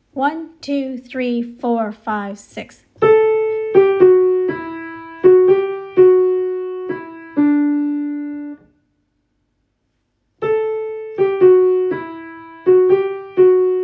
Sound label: clapping